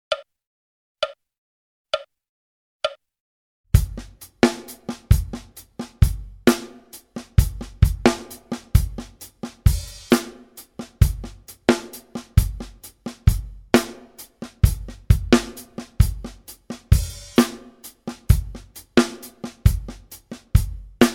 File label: Funk Rhythm 66 bpm, Guitar; Guitar Aerobics Disc 2